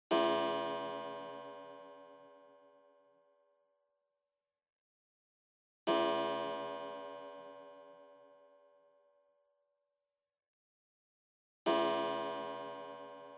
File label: Pendulum Clock Chime Set